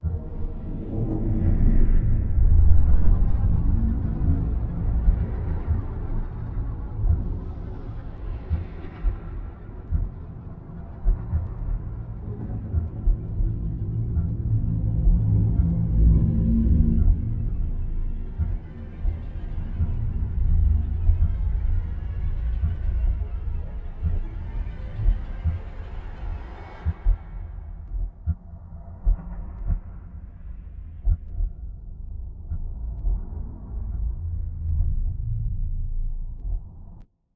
Music Notice Level2

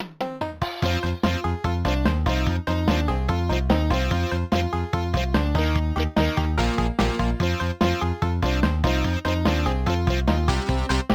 Forest BGM; cars supercharged leapster gamerip 2007

